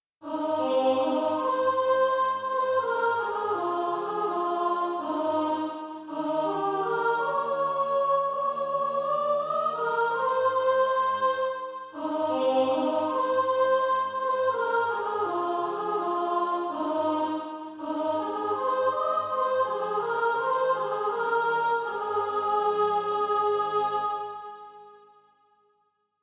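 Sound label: soprano, Practice Music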